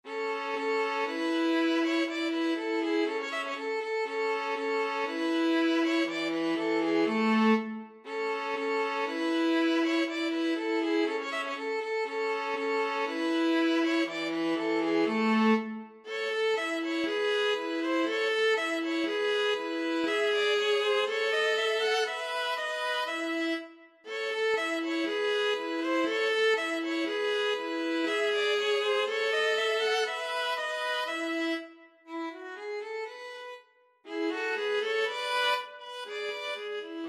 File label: violin viola duet